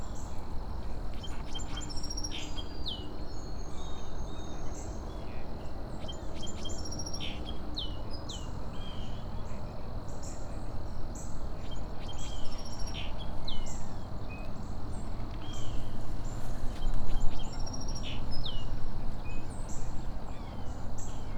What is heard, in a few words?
Melospiza melodia song; passeriformes; passerellidae